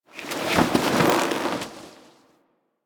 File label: Idle Screen Foley Slow; Warframe; Corpus; Corpus Lich